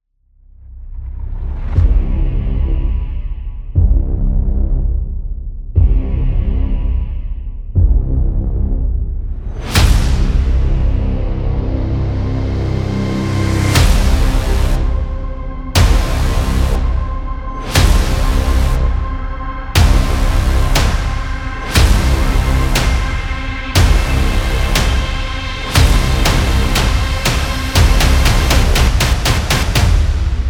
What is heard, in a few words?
Action Teaser, intense trailer themes